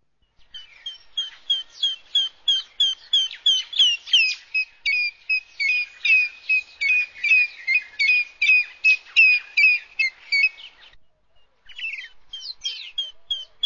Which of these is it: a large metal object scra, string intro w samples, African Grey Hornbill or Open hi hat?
African Grey Hornbill